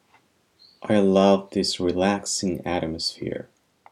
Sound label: relaxing atmosphere